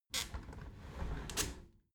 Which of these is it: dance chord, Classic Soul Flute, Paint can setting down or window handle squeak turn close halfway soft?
window handle squeak turn close halfway soft